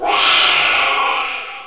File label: alien die; Army Men TiS